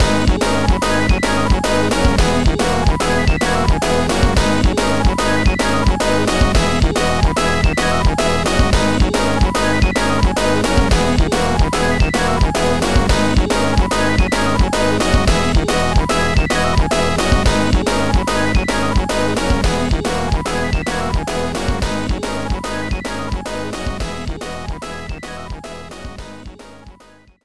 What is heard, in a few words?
BGM minigame boss